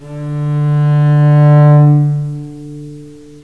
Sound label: D string arco, cello